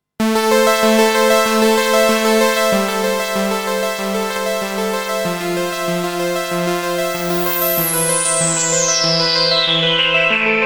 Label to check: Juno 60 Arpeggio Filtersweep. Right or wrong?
Right